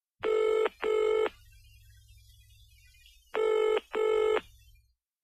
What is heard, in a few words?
old tele ring; ringtones